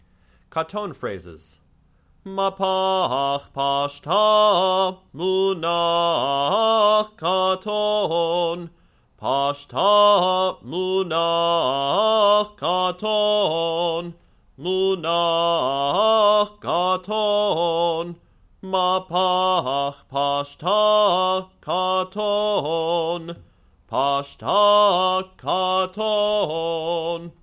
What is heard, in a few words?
Haftarah Trope Katon Phrases; Kol Ami — The Northern Virginia Reconstructionist Community